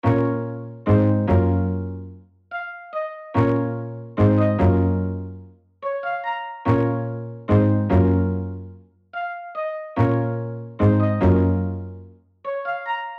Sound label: C min 145 BPM Pluck Synth